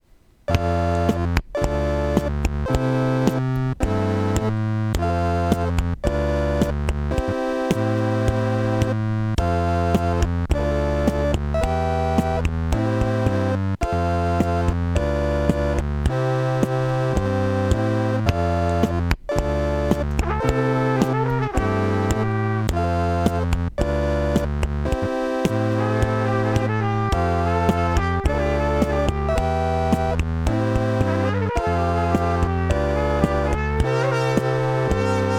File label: Organ Trumpet Tower